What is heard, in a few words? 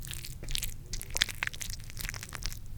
Squish